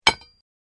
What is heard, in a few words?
Mining; Sunflower Land